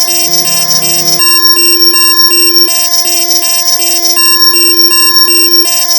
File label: NOISE, Synth FX Misc; E-MU Producer Series Vol. 8 – Platinum Phatt